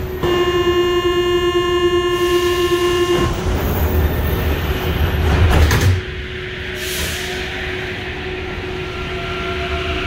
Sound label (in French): metro fermeture porte